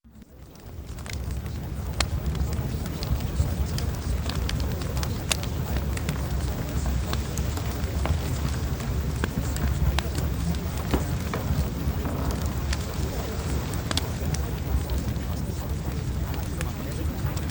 Fireplace

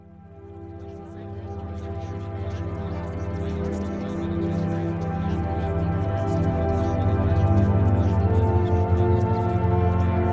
Music

